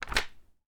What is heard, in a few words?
Page Turn